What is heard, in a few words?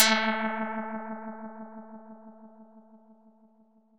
Vibrato